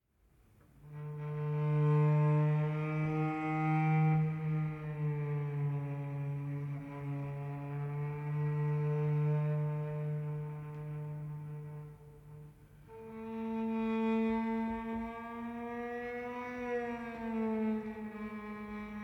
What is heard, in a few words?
Savannah Music